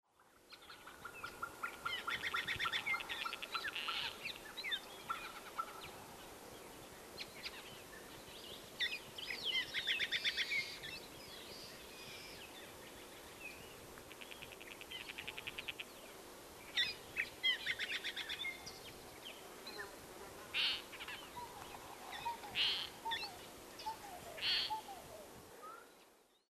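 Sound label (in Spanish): rara, Phytotoma rara, Rufous-tailed Plantcutter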